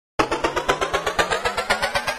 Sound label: Beach Day Perc Build 2 120 C